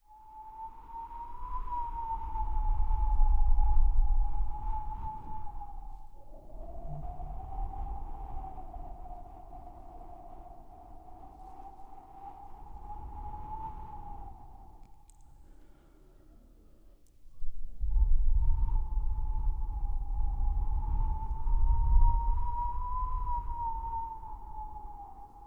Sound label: Atmospheric Artic Winds